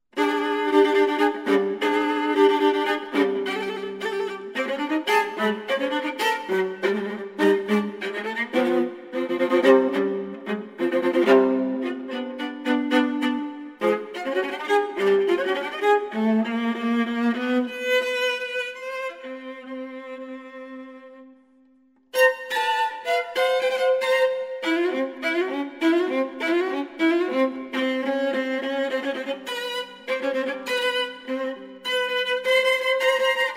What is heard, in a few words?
Bagatellen Combattimento (Viola)